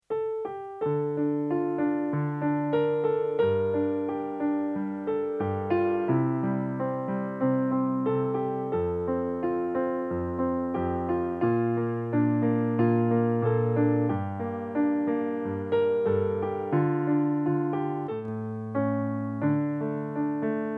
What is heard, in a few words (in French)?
chanté voix 1 et 2